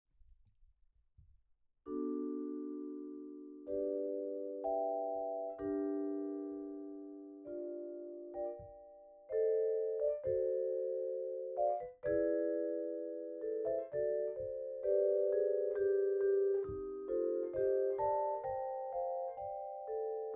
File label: harmony, vibes